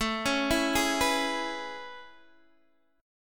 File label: A Major 9th A, Guitar, DADGAD